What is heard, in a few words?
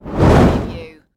Fireball Pass By Whoosh